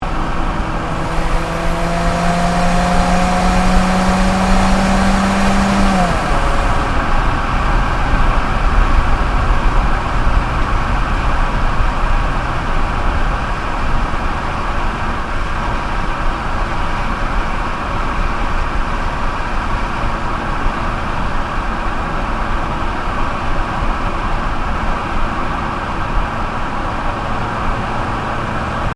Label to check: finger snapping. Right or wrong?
Wrong